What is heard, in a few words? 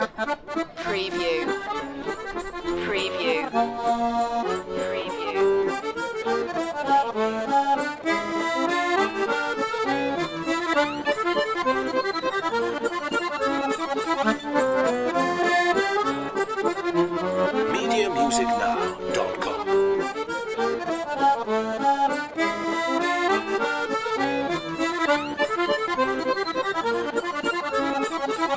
french street musicians loop